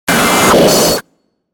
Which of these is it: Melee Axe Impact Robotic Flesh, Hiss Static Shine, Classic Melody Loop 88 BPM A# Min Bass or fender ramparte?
Hiss Static Shine